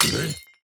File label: Break